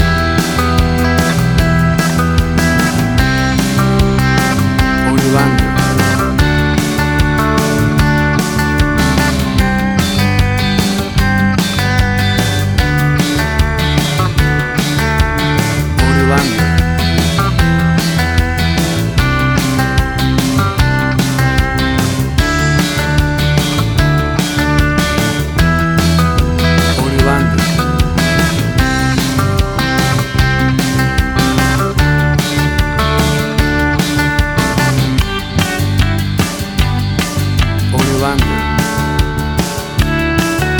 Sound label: 1990s Ballad Pop Rock